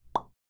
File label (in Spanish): Chicle Explotando